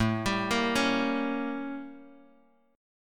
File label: A 7th Flat 9th A, Bass Standard; A7b9 arpeggiated; JGuitar